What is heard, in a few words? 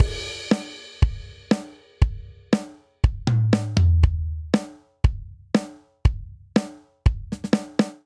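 Classic Rock Intro 119 bpm, drums; 4 4; MIDI Clips; Ableton; Factory Packs; Drum Booth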